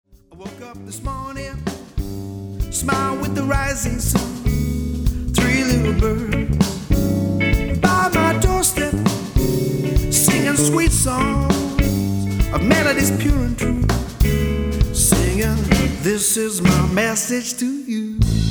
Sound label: Funk